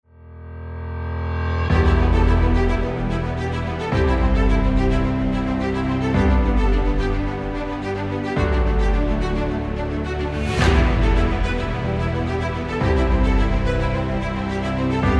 congrats music

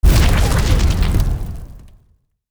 firerock; level 2

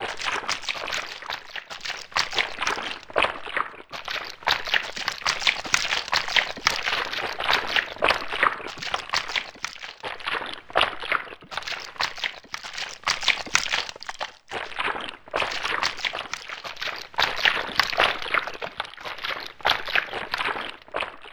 mud sloshing

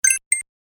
effect collect

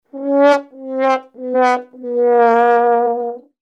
sad trombone

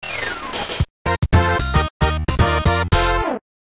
effect exit

